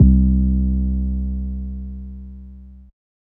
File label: Soul, 808s